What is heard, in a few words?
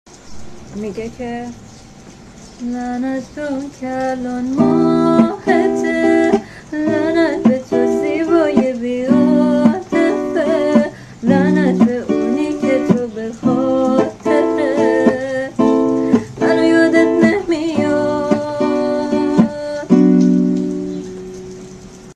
Guitar Version